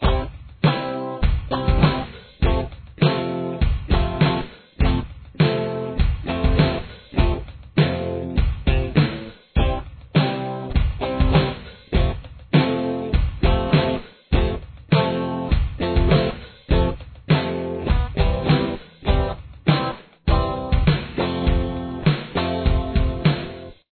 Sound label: verse guitar